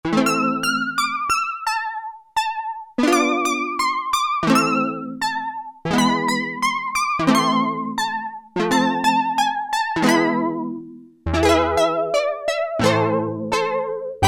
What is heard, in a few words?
Oriental Sound, Korg PolySix; Factory Presets Bank B